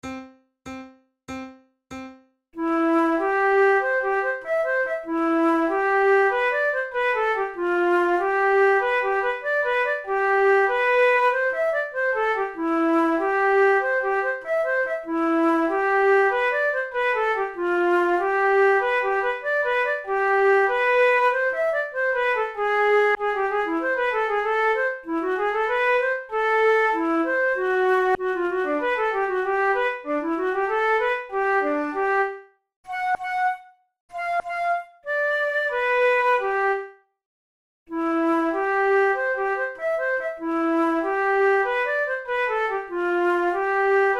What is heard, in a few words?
duets, flute; forty progressive duets